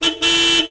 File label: Long Horn